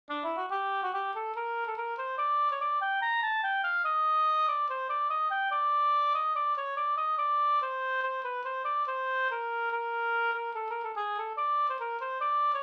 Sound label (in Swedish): schottis; Syrsornas dans fi